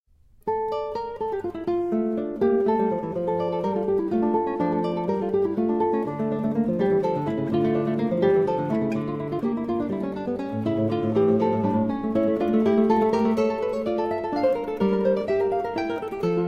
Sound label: sonata e Minor